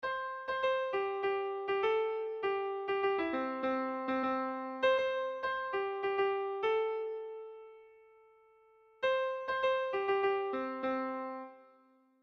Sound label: MIDI